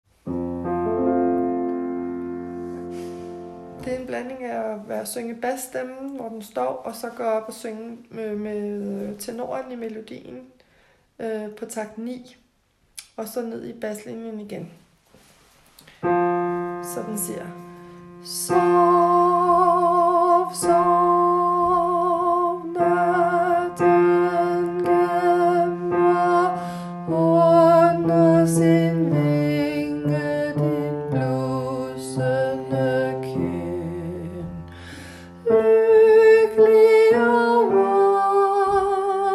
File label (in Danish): bas